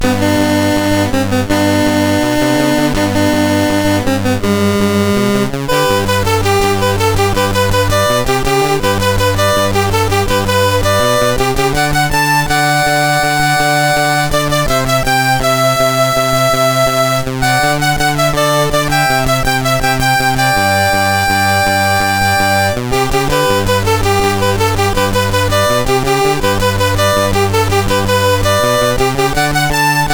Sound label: Squeeze Box A MOS6581R3